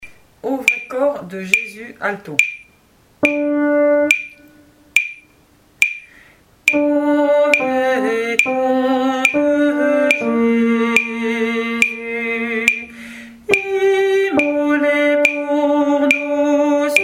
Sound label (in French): ALTO; O vrai corps de Jésus; chorale paroissiale; semaine sainte